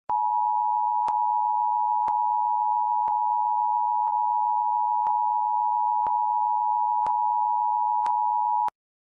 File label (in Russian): Signal sireny sirenogolovogo